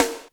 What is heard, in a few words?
PERC; ZERO-G; Datafile